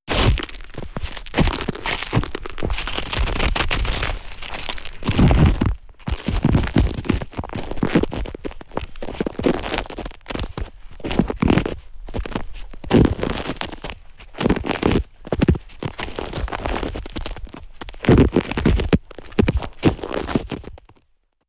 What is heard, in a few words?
snow samples - stepping and crushing